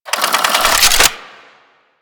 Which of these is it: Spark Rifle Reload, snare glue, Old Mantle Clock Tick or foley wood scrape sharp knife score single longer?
Spark Rifle Reload